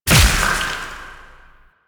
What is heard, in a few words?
Impact Large; Weapons; Warframe; Duviri; Incarnon Adapters